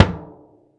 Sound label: BD, Bassdrums, Roland TD-20